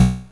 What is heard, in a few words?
Drums, Hits; DCM8